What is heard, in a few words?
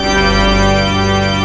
PIPE ORGAN G1; ORG MIX